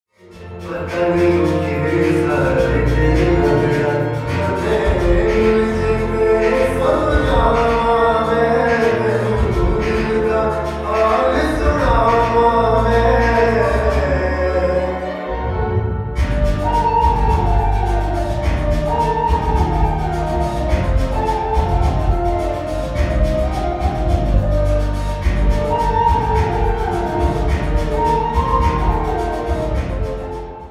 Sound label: Sad punjabi Song Ringtone